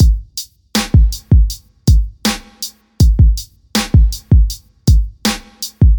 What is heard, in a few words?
Loop 80bpm 2 bars